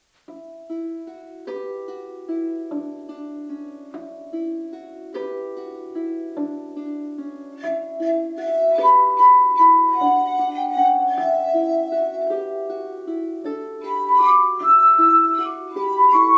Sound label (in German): Harfe; Scarborough Fair